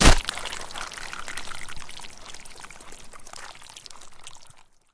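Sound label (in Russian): звонкий шуршащий звук простреленного бака с водой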